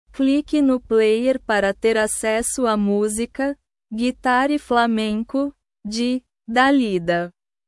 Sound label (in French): guitare flamenco